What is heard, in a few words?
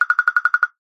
cartoon wood taps knocks woodpecker; zapsplat